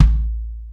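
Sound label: kick, Roland; Club-50 - Foundations Roland; Ext.Snare 2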